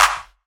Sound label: clap D key, Royality free clap sound clip tuned to the D note. Loudest frequency: 2356Hz; percussion; single hits one shots